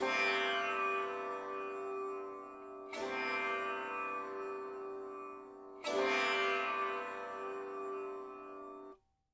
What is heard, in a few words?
sitar